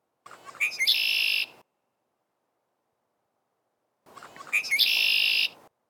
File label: Blackbird Red Winged; birdsong